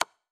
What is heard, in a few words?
move check